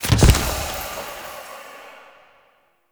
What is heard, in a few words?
Lantern Balloon Destroy; Objects; Ode Lantern Crate